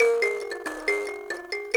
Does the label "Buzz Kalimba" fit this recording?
Yes